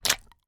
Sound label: human mouth wet open squelch; sound effects one